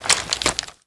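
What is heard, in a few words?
weapon foley m79 reload put away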